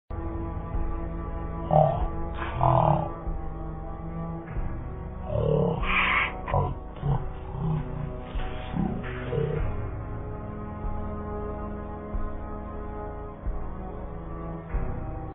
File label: kid; vapesquared